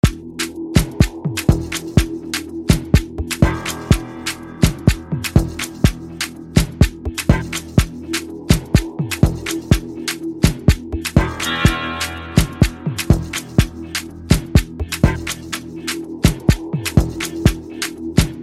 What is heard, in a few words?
Afro House Mix